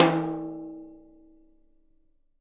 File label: Living Drums, JZ XTOM 1